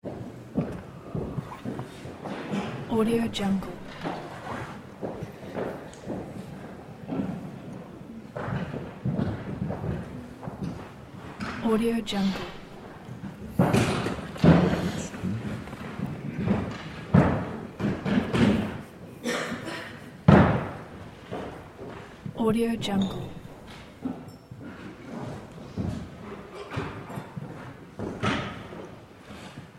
theatre ambience; alexander-blu

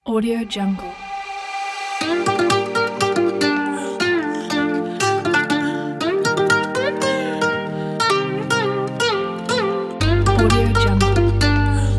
trap guitar vibe

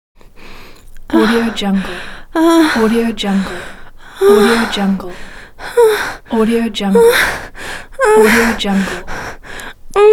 female moans

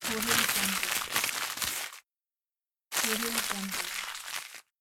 the paper crumple

